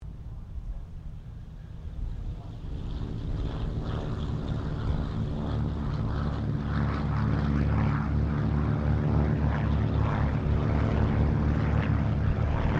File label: AIRCRAFT BIRDDOG SQUADRON 3 TAKEOFF, Squadron of 3 Birddogs; Airplanes; Vehicles